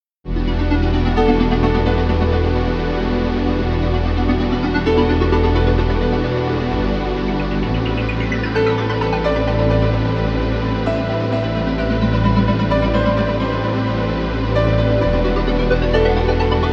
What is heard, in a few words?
130 BPM, loop